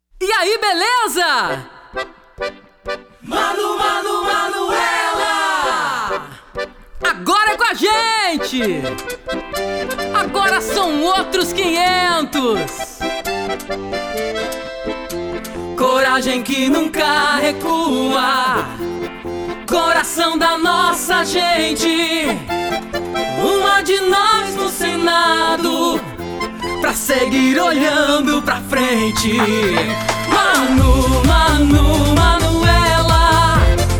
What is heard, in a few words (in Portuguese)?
uma de nos jingle